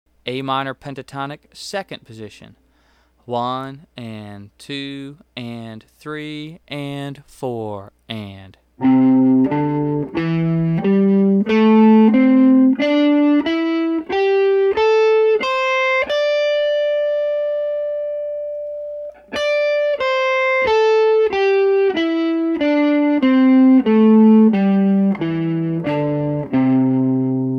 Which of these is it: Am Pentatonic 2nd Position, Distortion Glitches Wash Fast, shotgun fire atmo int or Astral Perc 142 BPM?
Am Pentatonic 2nd Position